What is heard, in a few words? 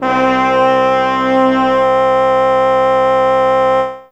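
Brass Section C4, Fairlight CMI IIe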